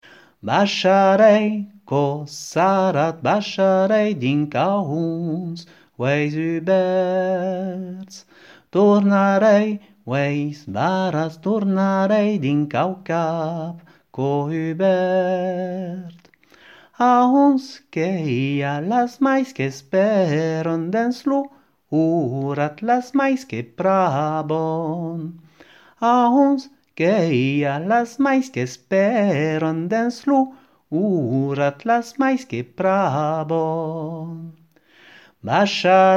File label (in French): chant